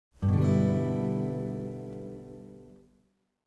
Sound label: g maj6 open